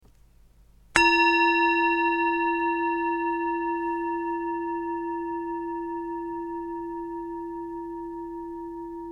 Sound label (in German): Klangschale